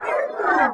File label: crescent slash; fowl-play; abilities